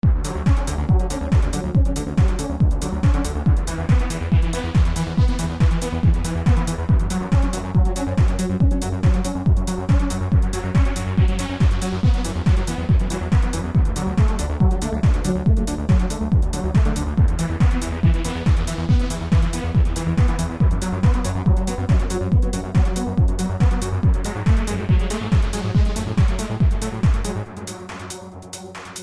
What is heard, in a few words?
Trance ringtone